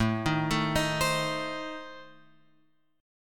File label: A Diminished A, chord shape; Adim Chord {5 6 7 8 X 8} arpeggiated